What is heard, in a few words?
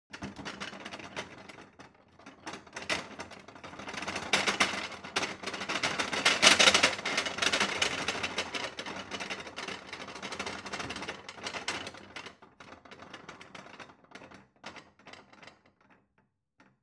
Kitchen plates rattle; phong bep